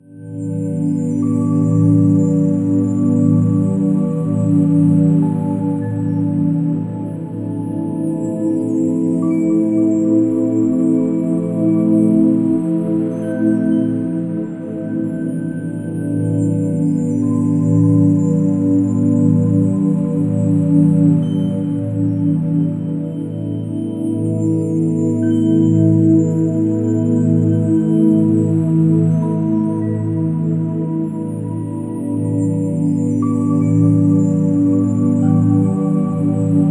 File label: Meditative Music